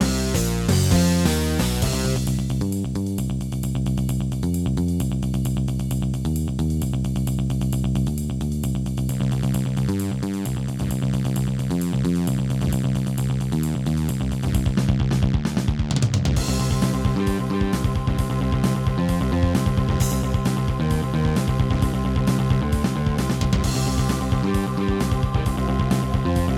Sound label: Yamaha DD-55; sigil doom episode 5